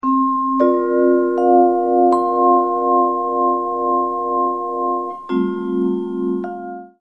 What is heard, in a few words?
Dreamy Accent